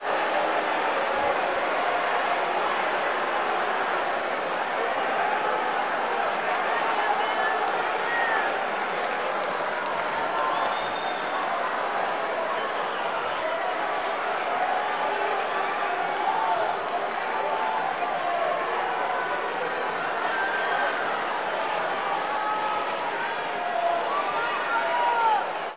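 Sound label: Basketball Crowd Ambience; Crowds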